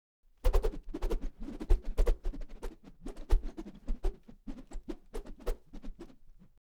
Whooshes Quick Succession, Twirling Fast; Fairlight Sound Lib

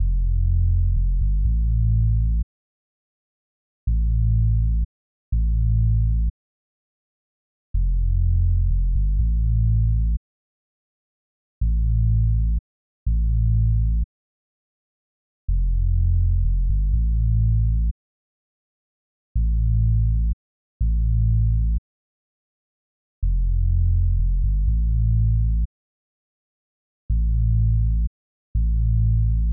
124 BPM A Min Bass; Trap Melody

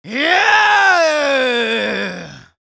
Yells, Viking Vocal

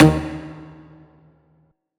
Riddim Synth Hit D#; One Shots; Striker; Ghosthack; Riddim Essentials 2020

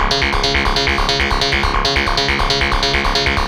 C# 138 BPM, Arp Loops, Psytrance

